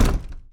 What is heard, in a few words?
Foley Door Closing; Ghosthack Foley Samples and Sound FX